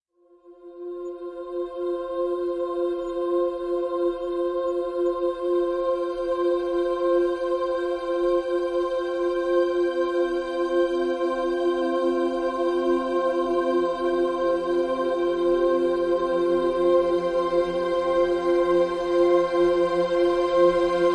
String (F)

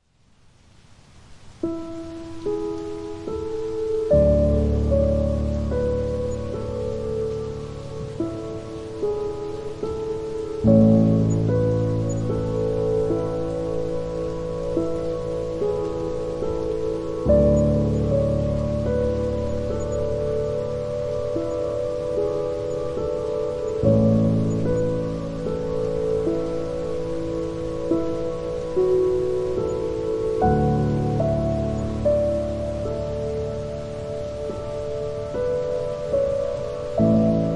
Good Night With Rain, Piano

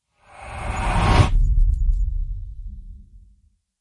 Darkness Wipe Wipe; Ninja Tracks

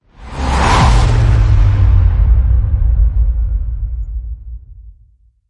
Short Hit Downer; Ninja Tracks

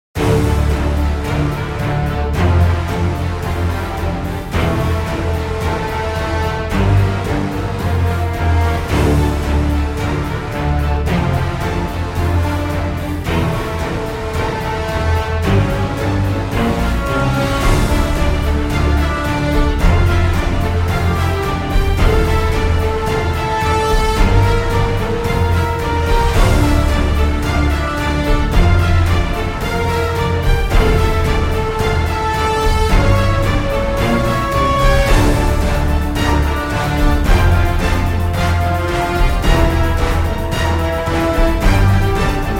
No Vocals

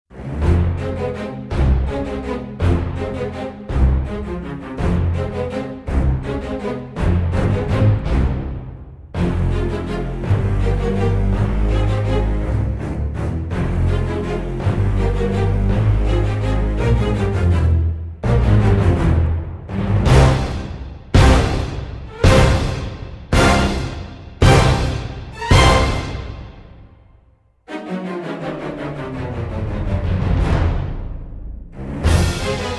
Witchy Waltz

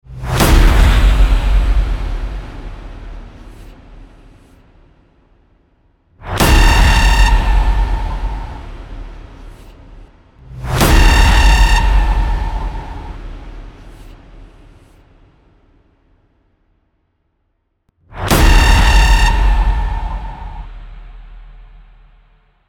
Blaring Hits Only; Ninja Tracks

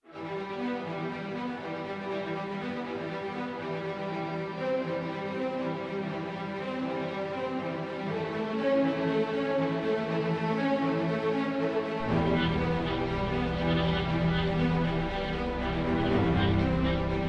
Action Ambient

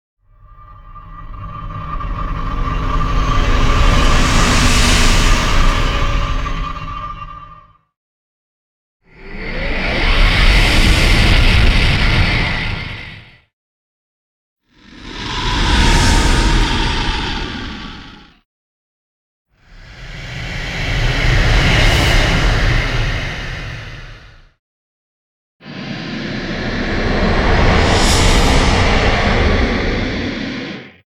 Swooshes (Distorted); Epic Tools vol. 1

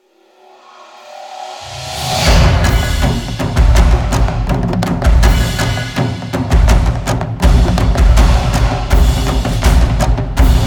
Big F'N Drums

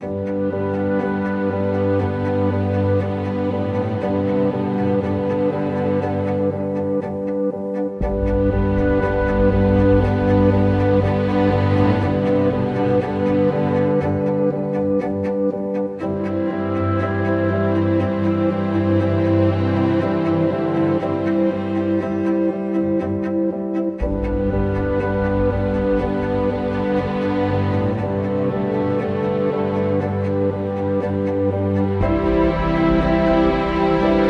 Ambient

